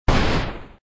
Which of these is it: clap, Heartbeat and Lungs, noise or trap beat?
noise